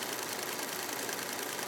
paytable Loop; eggomatic

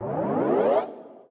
energy Fill Up; elements

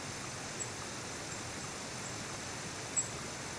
Forest Ambience Overlay; Maingame; koi princess

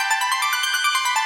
countup loop; irish pot luck

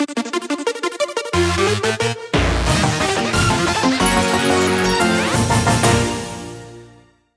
joker Win Add; mega joker

